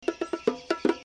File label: bongo; mekanta